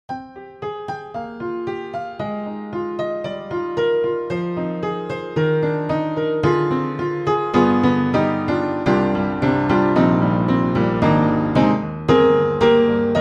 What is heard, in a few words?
Upright Pianos Vintage Upright Creakin' Vintage Upright; Synthogy; Ivory II